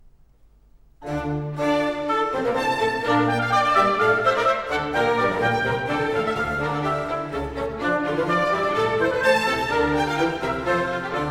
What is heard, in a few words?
Concerto Grosso in D minor; Handel Masterworks; Klassika